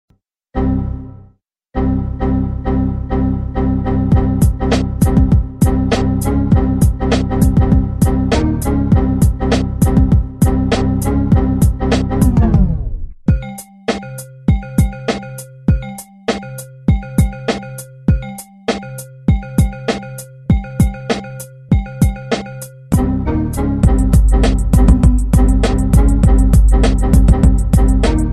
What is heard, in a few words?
Music; Windows